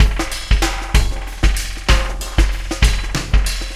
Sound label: ZERO GRAVITY BEATS